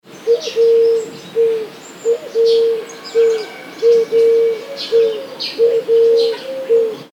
eurasian collared dove

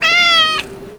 meow sound

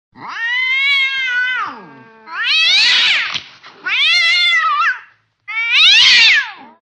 cat fight sounds

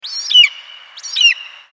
eagle sound sms tone

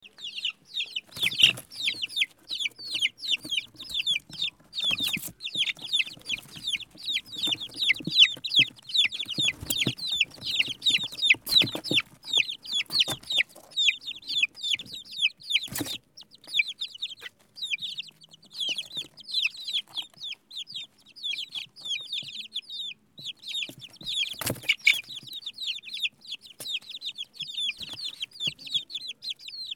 baby chick sounds

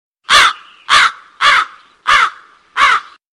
crow sms

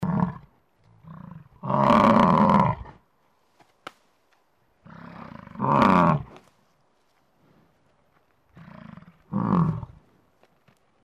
bison

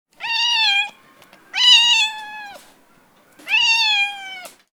cat sounds

